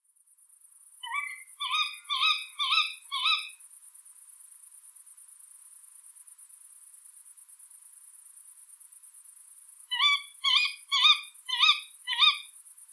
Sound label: Tawny Owl Strix aluco